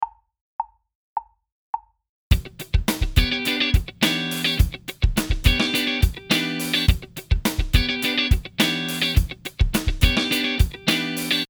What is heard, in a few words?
Minor 7ths